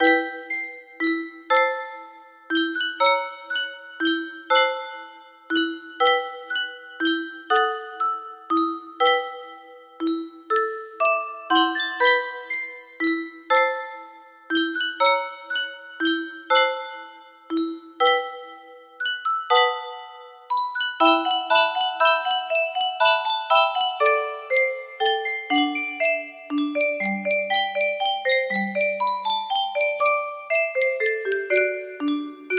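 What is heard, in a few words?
music box melody; mary poppins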